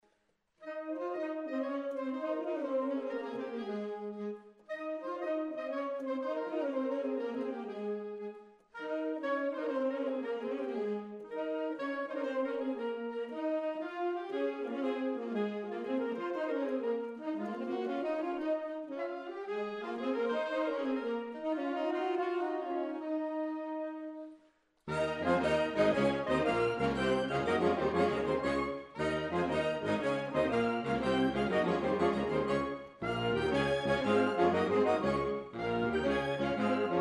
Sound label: Soprillo